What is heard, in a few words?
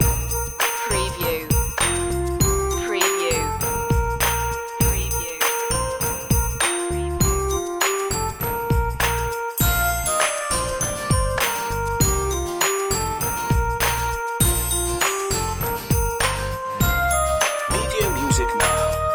good king wenceslas loop